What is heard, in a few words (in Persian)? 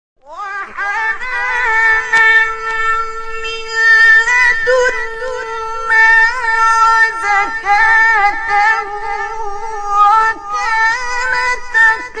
تلاوت روحبخش آیات تا سوره مریم; 13; عبدالباسط